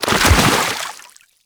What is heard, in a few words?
Roll Forward Foley Water B; Enemies; Warframe; Teshin; DE